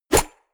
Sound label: golf ball hit